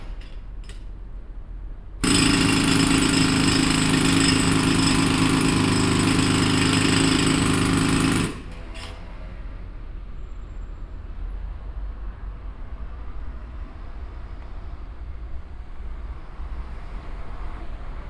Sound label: Pneumatic Drill; Sound Scapes